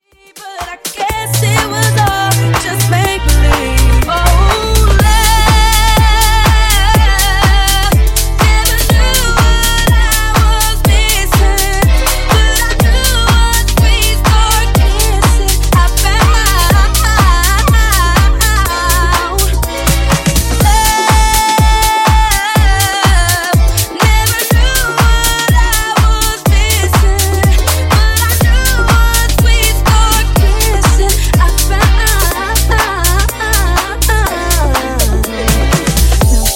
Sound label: Love Funk Nudisco 123 Bpm